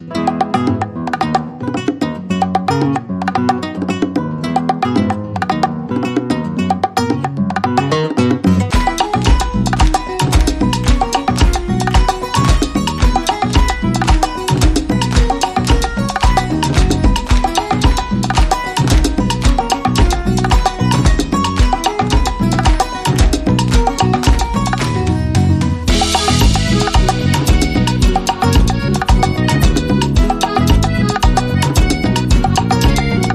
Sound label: Cuban Rhumba